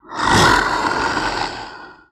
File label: zombie